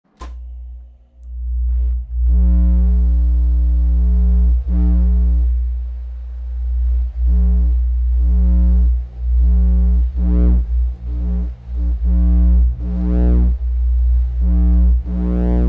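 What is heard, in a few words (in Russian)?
демагнитайзер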